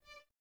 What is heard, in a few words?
Violins D 5; Miroslav Vitous; String Ensembles; Detache